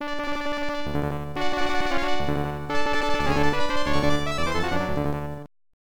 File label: Game Start; Pro Yakyuu Family Stadium Pennant Race; MSX2; MSX-Music